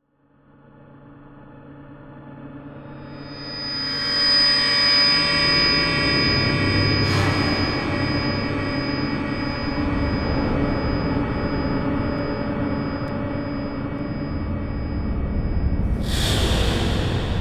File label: Electronic Whoosh Reverse Feedback Digital; Module Production Element Title Imaging; Imaging Elements